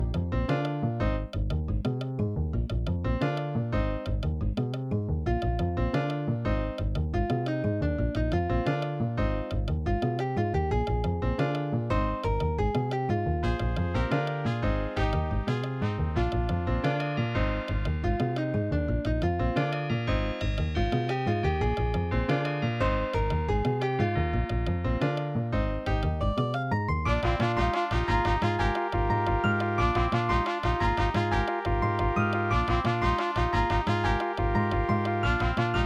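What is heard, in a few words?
level1, Ensoniq Sounscape Elite; soundcard test; Hocus Pocus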